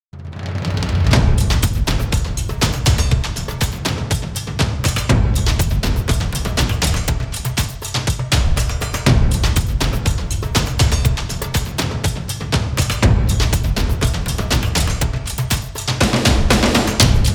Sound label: Epic Percussion Drums